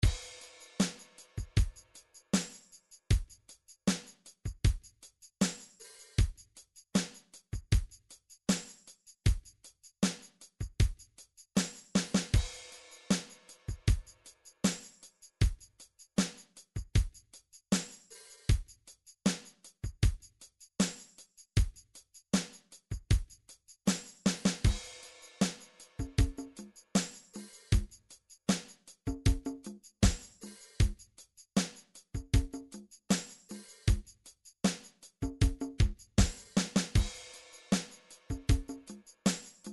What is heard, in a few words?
drums, midi drum patterns